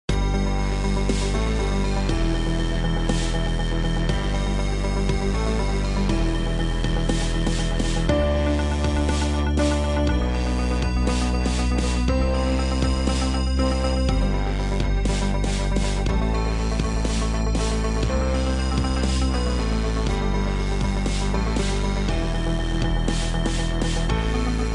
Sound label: silent night dubstep